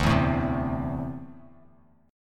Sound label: D 7th Suspended 2nd down Bass Standard; D7sus2 strummed